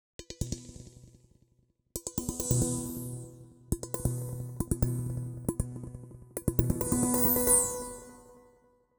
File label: Synth, 068 Bmin, Hip Hop Exotica; Apple Loops; Big Fish Audio